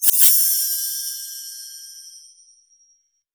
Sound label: Metal, Percussive FX; musicradar; cartoon caper